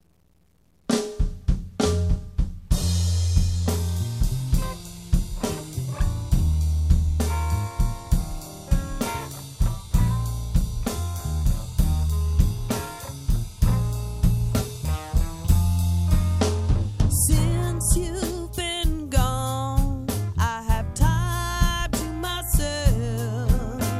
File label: Blues